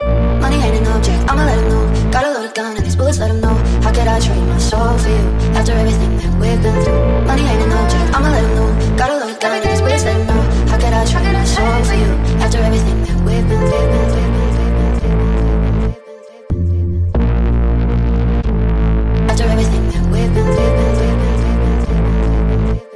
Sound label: 140 BPM D Min, Speed House; Melodies; Cold Blooded